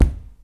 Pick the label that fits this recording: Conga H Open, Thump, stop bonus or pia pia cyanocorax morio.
Thump